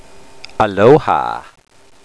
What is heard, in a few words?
hah; HAWAIIAN; Hello; Aloha; Ah-loh'-hah